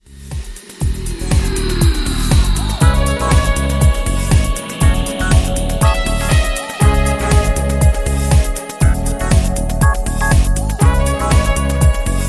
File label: Fill This Beat